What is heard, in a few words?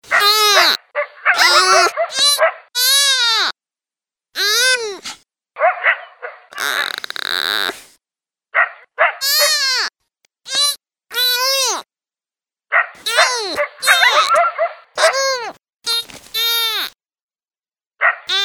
Coyote & Fawn Distress